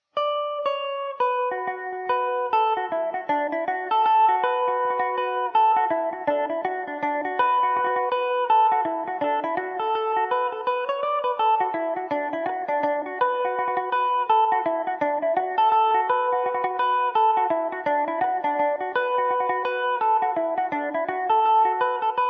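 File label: B minor, Irish mandolin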